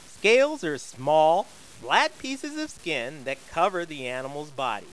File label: scale